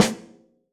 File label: Snare Stick Hit L; One Shots; Drums; Ableton Live 12 Lite; Core Library